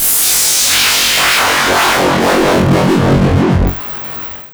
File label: LFO noise; extended noises